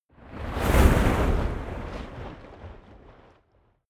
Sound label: waves surge